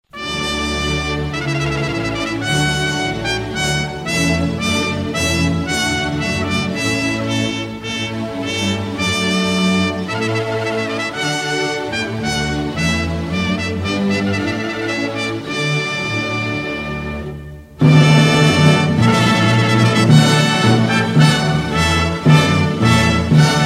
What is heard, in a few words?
fanfari